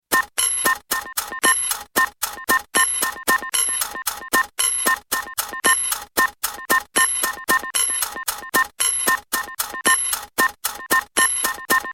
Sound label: futuristic ringtone